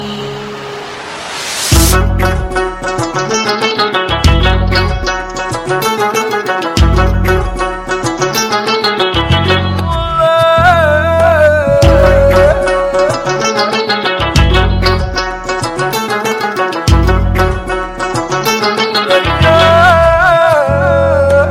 Whoo

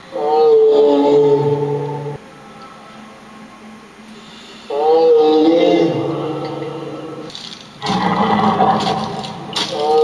Howl

